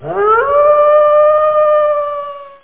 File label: WOLF; azoo; forest